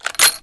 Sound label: svd clip in